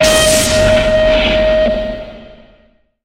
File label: Weapon